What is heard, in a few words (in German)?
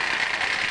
rollo loop; volvo 9900; Vehicles; AI volvo 9900; Freyfurt Beta